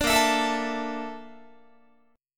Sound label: A sharp Major 7th Flat 5th A sharp Guitar; strummed